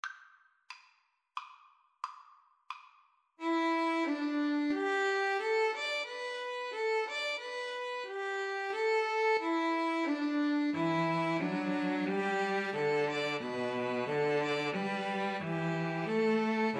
trio, string trio

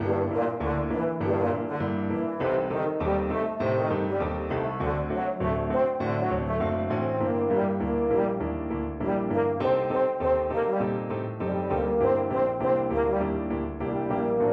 euphonium

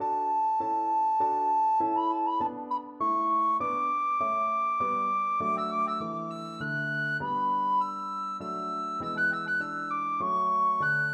minuet, recorder

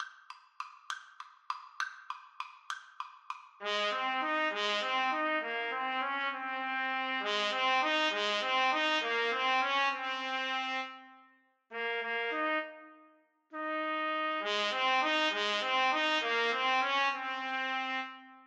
trumpet duet

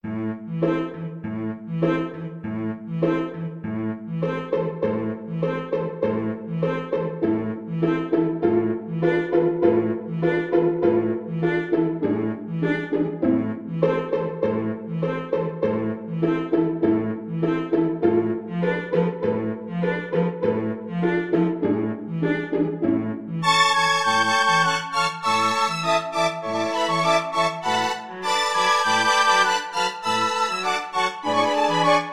habanera BSN, Bassoon

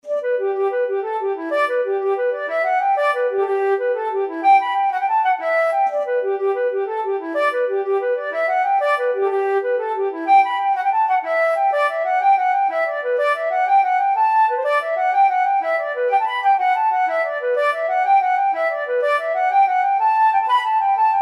flute

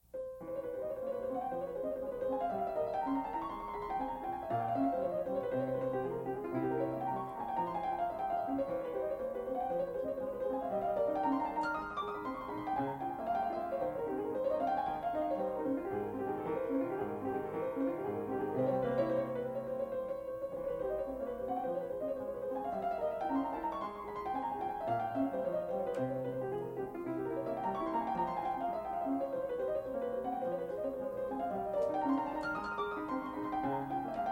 Etude, piano

